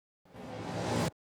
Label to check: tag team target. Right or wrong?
Wrong